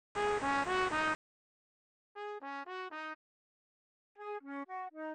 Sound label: noise instr